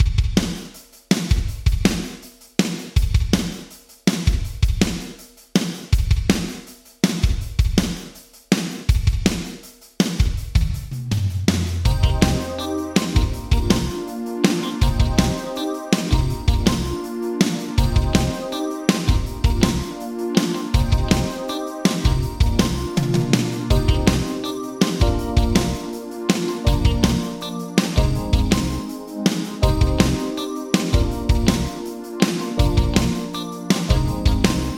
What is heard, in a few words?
Drums Yamaha ProR3 ER Gate 2 Large Hall Vintage Digital